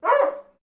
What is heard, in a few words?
Doberman Dog Bark Ind